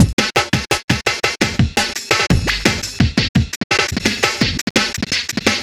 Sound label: DJ Beat, Drum N Bass; Sonic Foundry (Sony Creative Software); Knutrix Drum & Bass